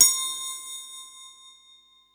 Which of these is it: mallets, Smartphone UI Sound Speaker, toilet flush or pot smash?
mallets